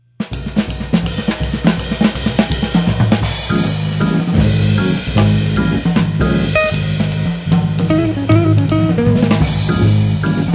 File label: Tonewheel Cut, hammond